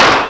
SLAP

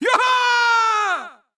cheer

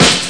EGG Snare; Utilities Professional

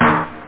raggae, drums, snare

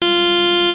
BAD BUZZER

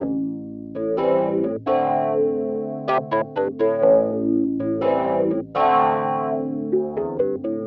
organ house 125 A; eJay; Keys

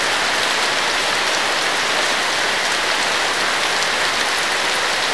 Rain; ImpGal2; groundwar

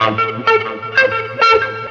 ELEC GUIT ETHNO 125, Guitar; eJay

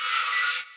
old beeps; 10,458 sample rate; 0.09 seconds

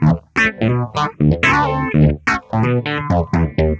guitar; flash tutorial

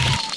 aliens

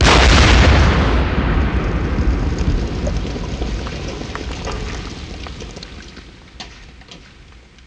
expl rgr; HG2DEMO

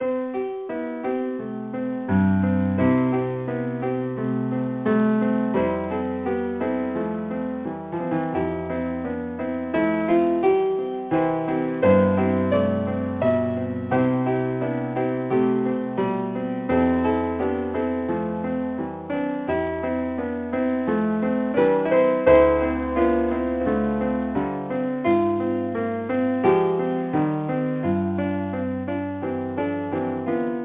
PIANO

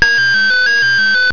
alarm

